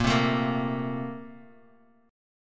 A sharp Major 7th Suspended 2nd A sharp down Bass Standard, A#M7sus2 chord; strummed; JGuitar